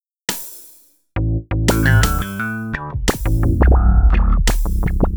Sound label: Call, Hip-Hop; Sonic Foundry (Sony Creative Software); Hydroponic Hip-Hop; Combo